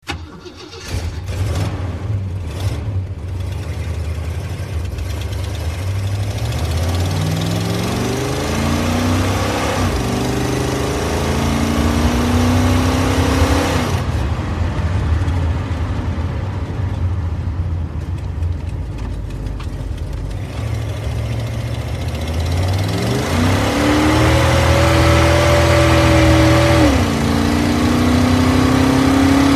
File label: Zvuk Dvigatelya, chevrolet impala; 1967